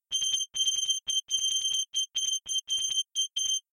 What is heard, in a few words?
beep message